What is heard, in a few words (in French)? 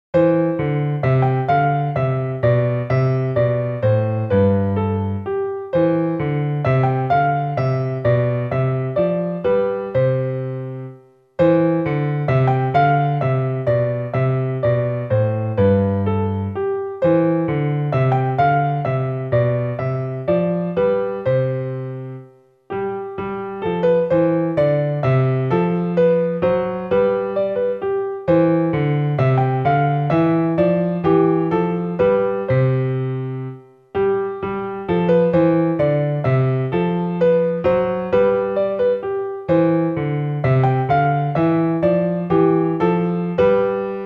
Menuet en Do, piano; BACH JC